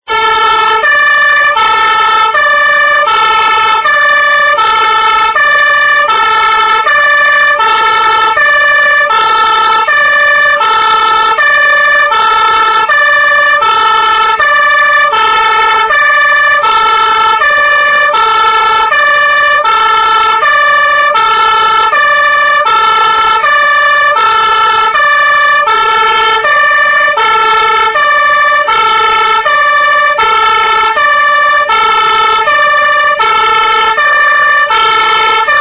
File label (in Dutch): geluid sirene brandweerwagen; kleuter